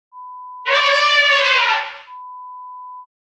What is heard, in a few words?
Elephant Trumpet